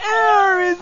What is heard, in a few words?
gurp; vortex; spongebob